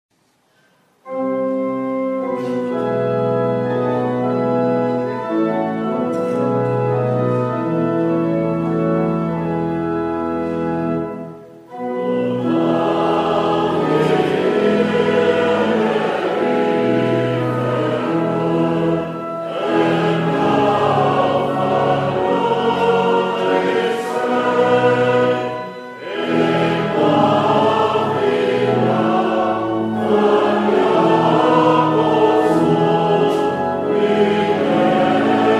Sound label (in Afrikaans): Gemeentesang; Ps 20-1 melodie ld602 verse 1,2,4